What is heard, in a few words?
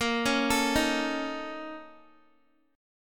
Bb Minor Major 7th Double Flat 5th, Guitar; x,13,13,14,15,x; BbmM7bb5 arpeggiated; JGuitar